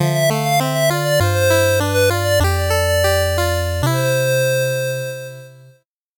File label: 8bit jingle normal